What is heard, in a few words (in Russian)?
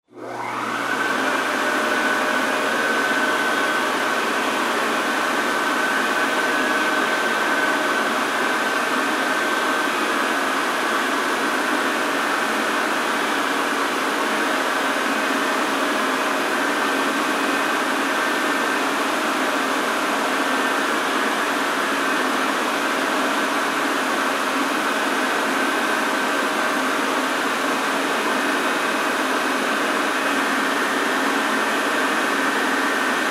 sushilka dlja ruk